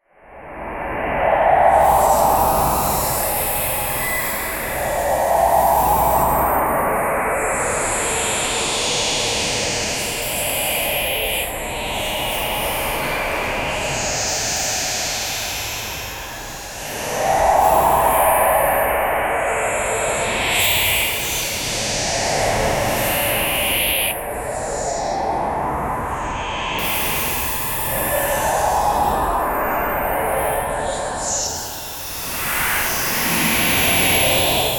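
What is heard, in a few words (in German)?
dramatische düstere demoni, demon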